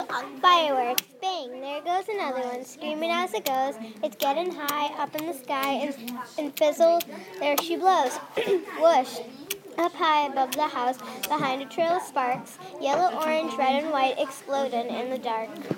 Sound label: firework